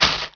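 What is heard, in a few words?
WALK, footsteps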